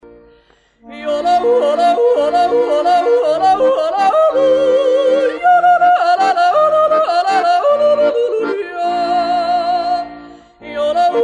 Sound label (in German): Jodler